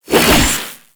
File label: Briefcase Katana Spin Whoosh; Warframe; Corpus